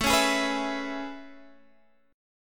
A Major 9th A, Guitar, DADGAD

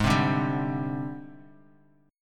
Ab Major 7th Flat 5th Ab, down, Bass Standard; AbM7b5 strummed; JGuitar

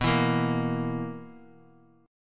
B Major 7th Suspended 4th B down; BM7sus4; strummed

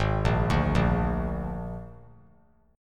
Ab Suspended 2nd Flat 5th Ab, chord; arpeggiated; JGuitar

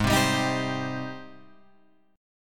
Ab Major 7th Ab down Guitar Open F; AbM7 strummed

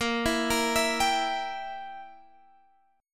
E Diminished A sharp

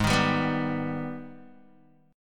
Ab Minor Major 7th, down, Guitar; strummed; AbmM7

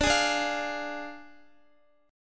D Diminished D, Guitar; Ddim strummed; x,x,14,13,14,13

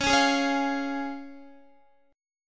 C sharp Major, Guitar; x,16,13,13,13,13; strummed; JGuitar

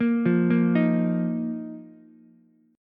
Eb Suspended 2nd Eb down Ukulele; Ebsus2 arpeggiated; JGuitar

